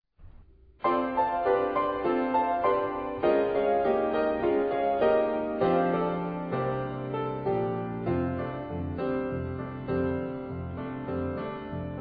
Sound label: Oh Peter Go Ring Dem Bells SATB